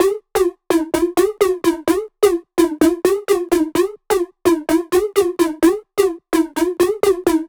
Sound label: Melody Kits 128BPM Root C#; Vengeance Samples